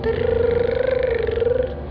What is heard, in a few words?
trilling